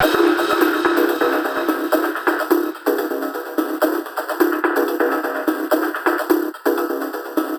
flames music

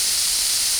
spray

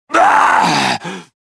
choke; kyle

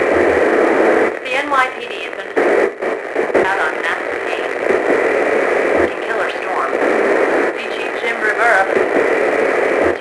generic radio note; Max Payne

